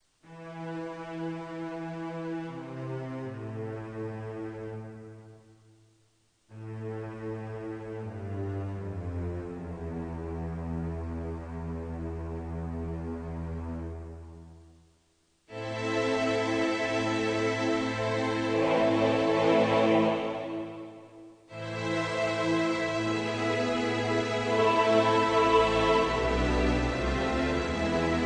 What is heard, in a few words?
Back Track; Verdi Requiem